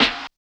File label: SIDE SN; East West; Phat & Phunky